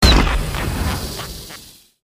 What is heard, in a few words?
effect burst use